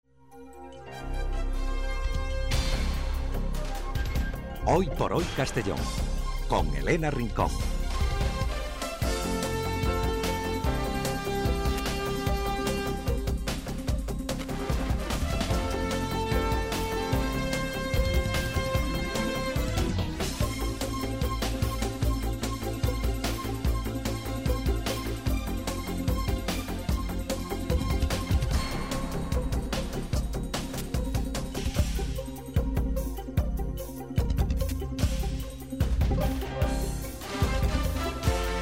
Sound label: jingles; Radio Castellón